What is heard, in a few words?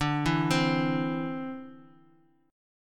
D 7th Suspended 2nd down, chord; D7sus2 arpeggiated